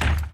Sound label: knight move; Akeron